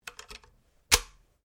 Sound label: RIFLE ANSCHUTZ 67MSR 22 CALIBRE MAGAZINE ENGAGE; A selection of domesttic firearms; Rifles